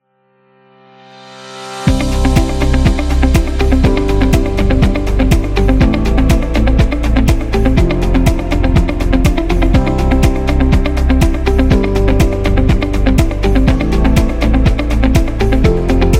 Corporate Event

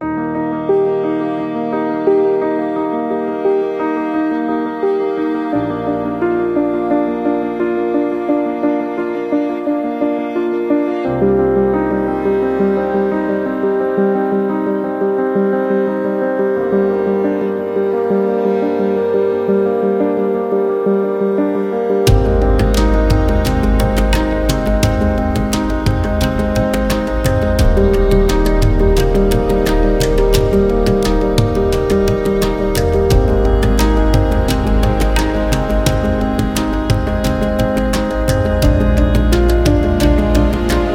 Cinematic Indian Background

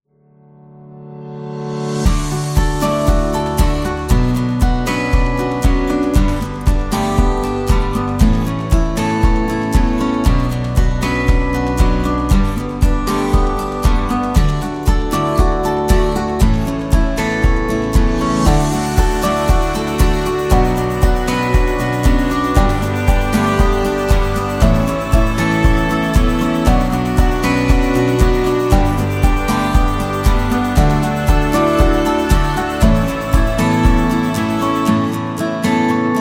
Upbeat Guitar

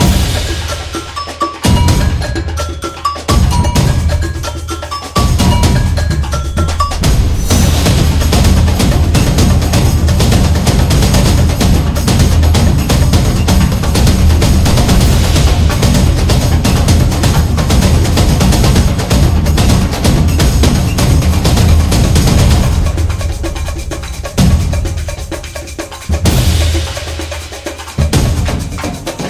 Latin American

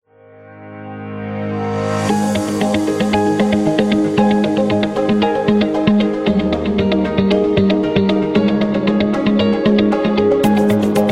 Upbeat Motivational

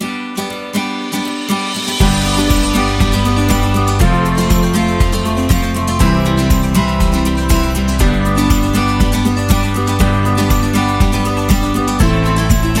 Happy Kid

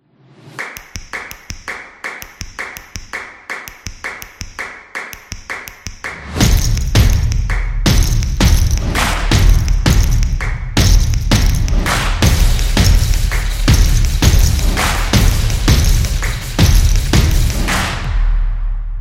The Drums Beat; soccer drums

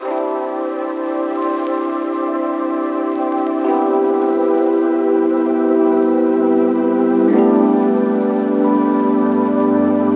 Lo Fi Chill; lounge

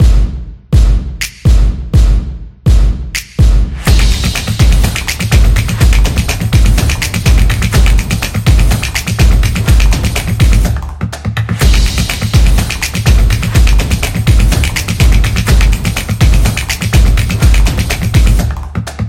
Racing; samba

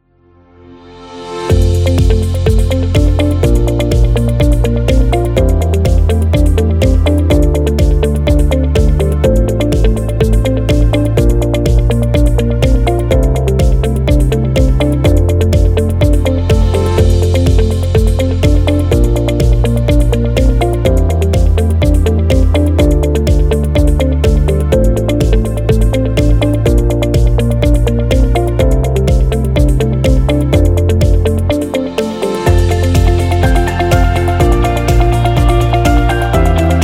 Corporate

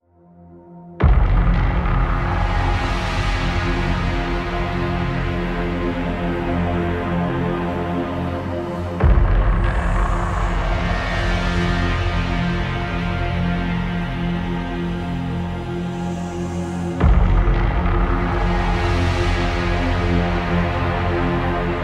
Dramatic Trailer; cinematic

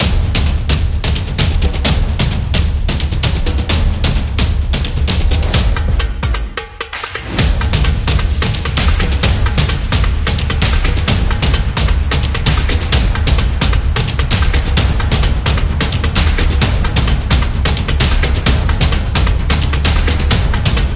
Rhythmic Drums

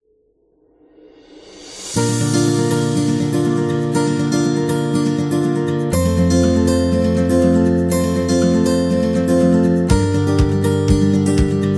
Wedding Guitar

